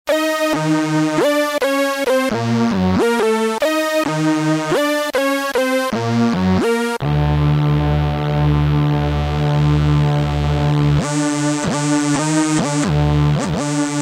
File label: mono raver; Roland M-DC1